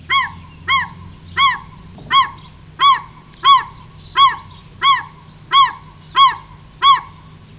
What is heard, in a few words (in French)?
goelan